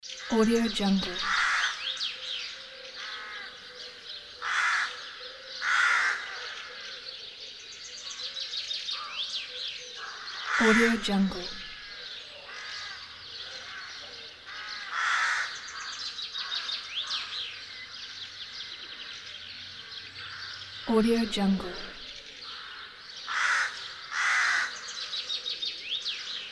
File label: crows morning ambience